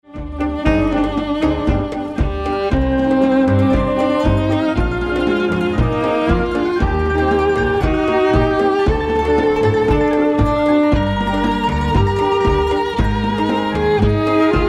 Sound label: Tango Bolero